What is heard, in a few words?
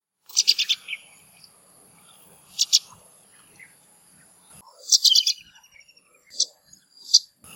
Pipit calls Ipoh Perak Malaysia